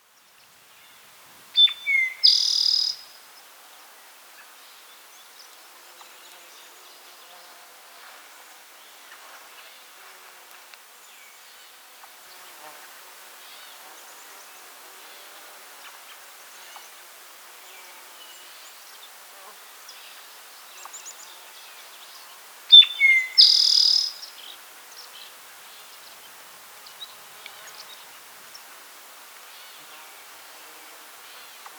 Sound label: Eastern Towhee Pipilo erythrophthalmus; sparrows